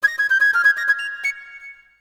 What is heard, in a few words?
bagpipe, notifications; ZTE Nubia-Z17-Mini